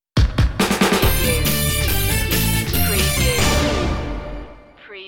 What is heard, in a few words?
company ident electro transition